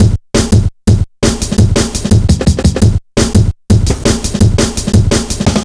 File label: full block, Drum Bass Drumloops 170bpm; Zero-G; Total Drum Bass